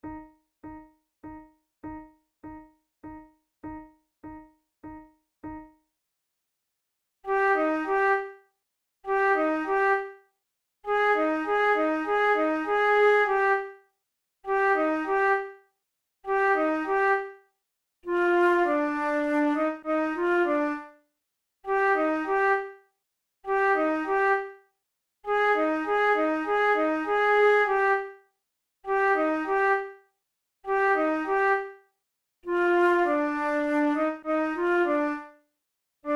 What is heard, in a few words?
methode de flute; tulou